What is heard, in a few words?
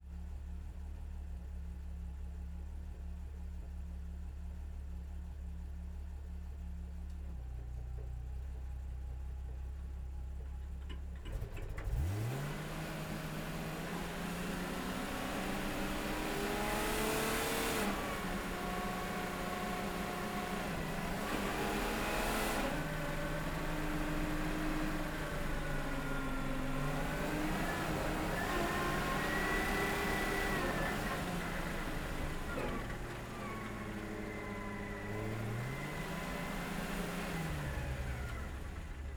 Pvrbbv 551 ONBRD SLOW Drive Stop Reverse Stop Drive Stop ENGINE Intake, Tank Destroyer; DPA4062